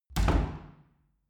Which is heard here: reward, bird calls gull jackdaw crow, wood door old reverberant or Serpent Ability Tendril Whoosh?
wood door old reverberant